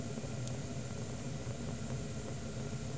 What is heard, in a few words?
gas stove; Wonder's Greatest Toyshop on Earth; OmniMedia